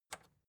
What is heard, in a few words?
record player power release on